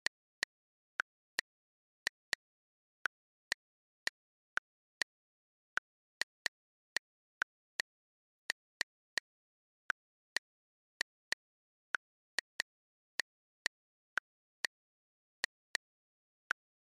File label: Smartphone Typing Slow